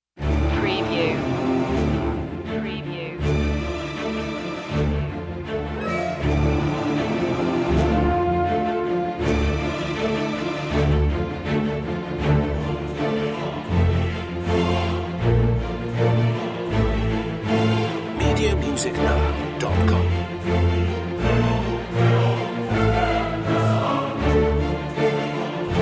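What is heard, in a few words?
with choir no percussion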